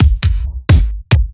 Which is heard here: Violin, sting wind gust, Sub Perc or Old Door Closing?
Sub Perc